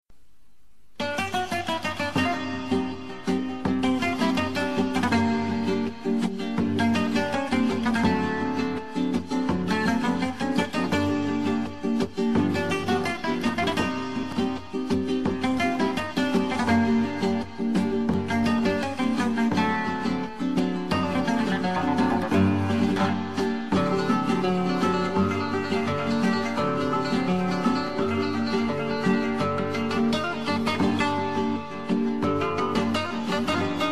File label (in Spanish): Rumba